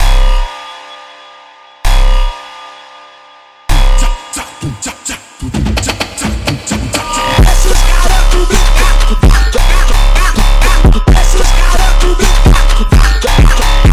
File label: Phonk Music; فانک phonk